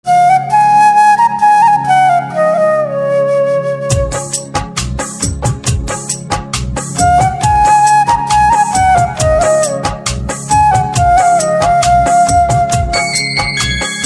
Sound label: Flute